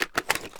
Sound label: reload empty mag out, weapons; gmod; viper